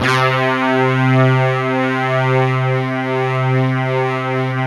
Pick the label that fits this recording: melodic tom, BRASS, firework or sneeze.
BRASS